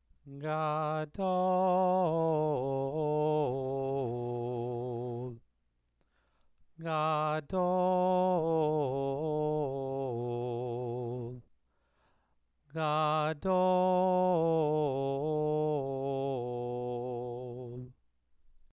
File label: Megilat Esther exercise